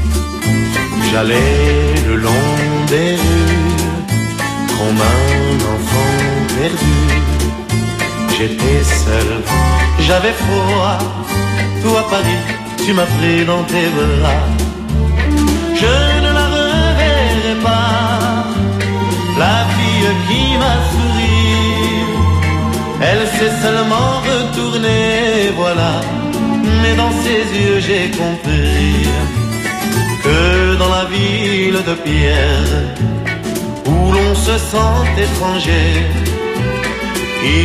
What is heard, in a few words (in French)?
Chanson